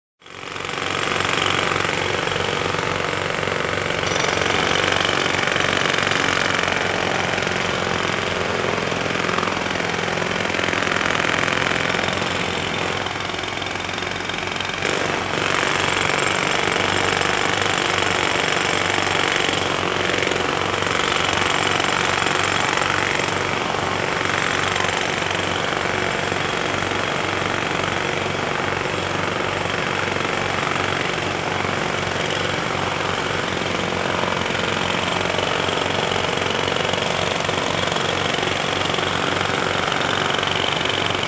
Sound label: Jackhammer on the construction site